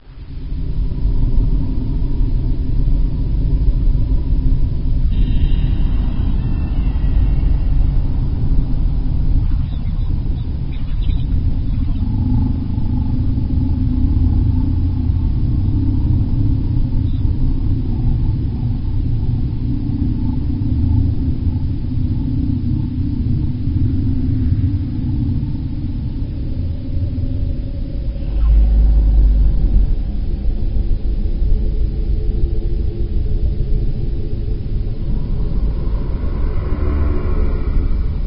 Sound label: fx creepy wind; justmansion